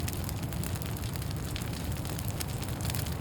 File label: fire small; emitters global